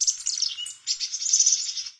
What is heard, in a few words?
random jungle; svencoop; underrain